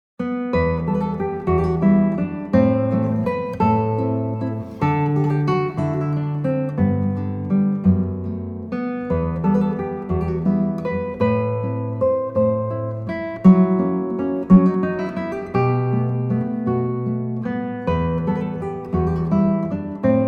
waltz

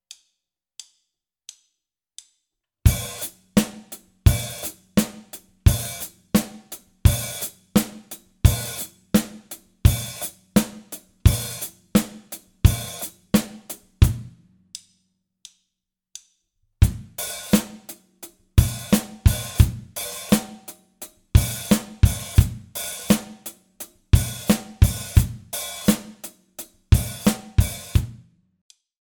grooves

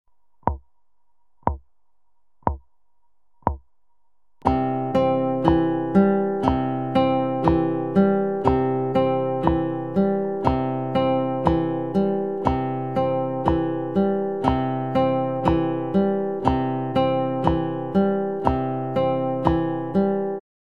picking

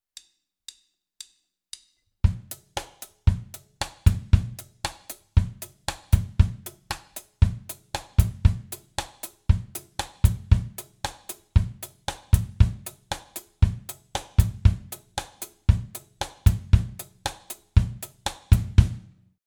basic ska feel

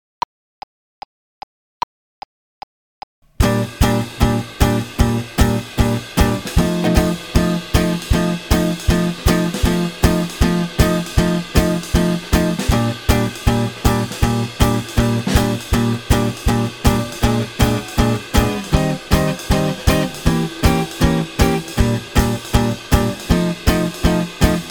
swing

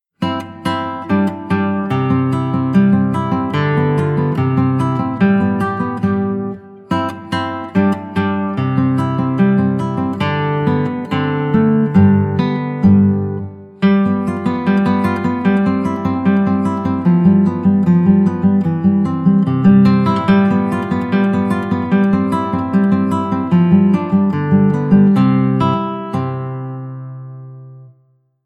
andante; ferdinando carulli

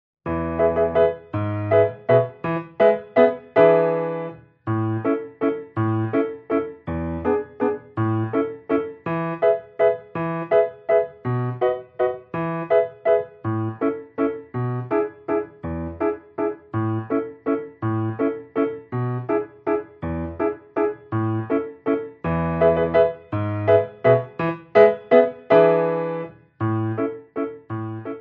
mazurka; mitspielversion

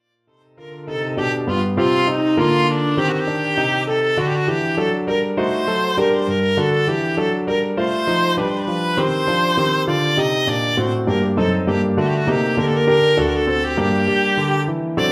gavotte; f couperin

